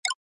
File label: close; effects